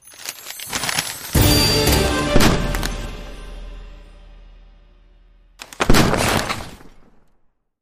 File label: click; Sheriff Of Notingham; royal latter